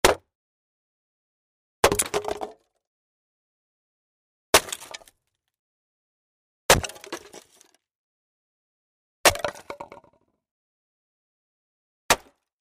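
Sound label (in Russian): raskalyvanie drov, splitting firewood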